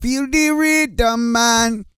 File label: REGGAE, Vocal Planet World Voices